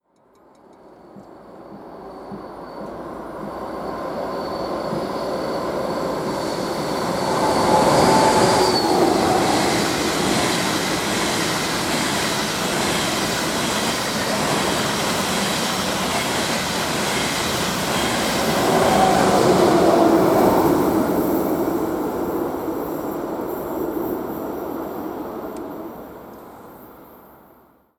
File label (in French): trains diesel et electriques; sonotheque